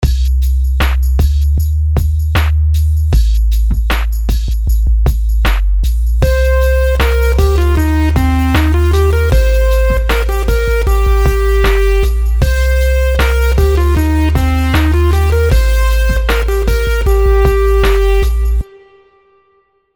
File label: melodies simple 16s Cmin; melody